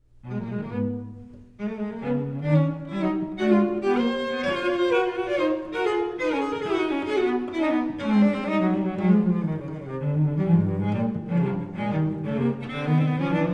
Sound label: inventions for two cellos vivo